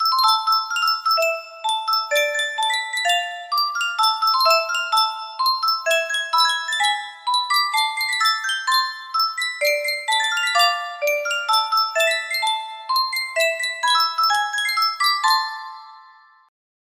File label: Yunsheng custom tune music box